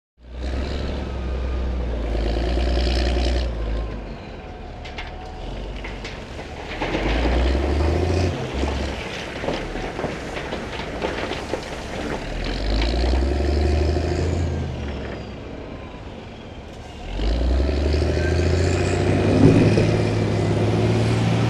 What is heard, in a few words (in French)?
Bulldozer